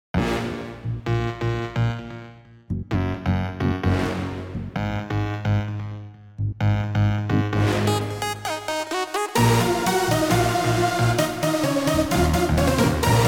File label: trance